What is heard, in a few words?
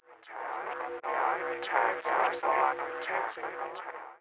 Voices